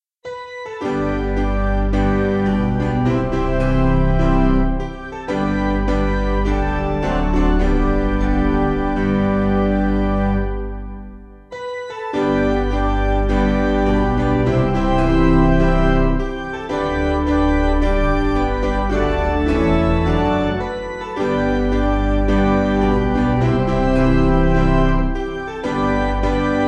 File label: O Piano